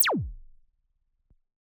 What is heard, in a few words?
boop